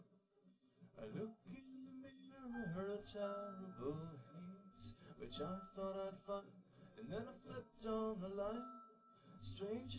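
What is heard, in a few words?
no drums, live recording 250ms 1m; SiSEC11; underdetermined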